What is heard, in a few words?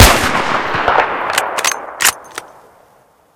m98b shoot